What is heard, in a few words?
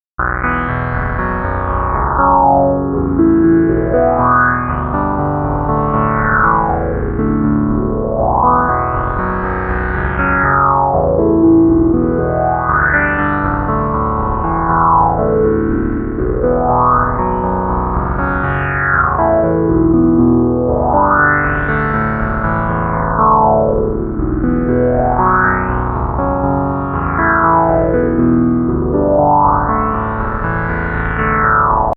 STRINGS